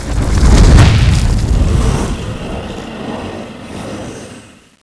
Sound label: storm end, scorpion